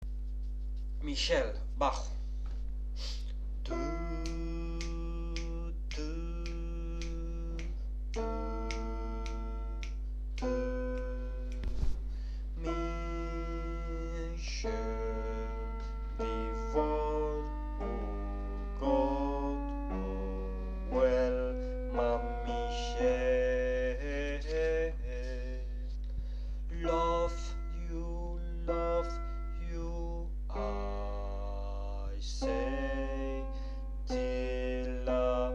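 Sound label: bass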